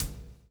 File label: PULL, Backbeat; Spectrasonics - Backbeat